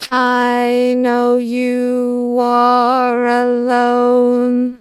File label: singing